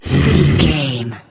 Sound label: troll monster effort tired